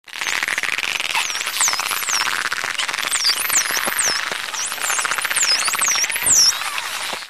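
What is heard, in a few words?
squeak and strange sound made by dolphins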